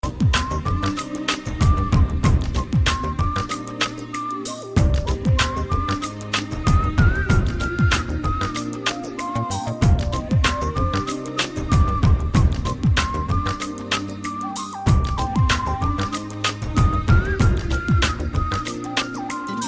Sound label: Romantic Ringtone